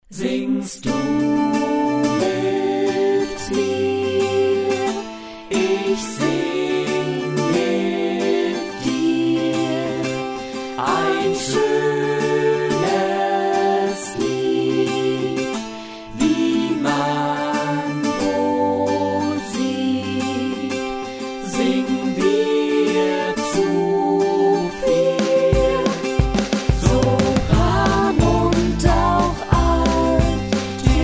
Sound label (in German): vocal etuede ohne tenor, chor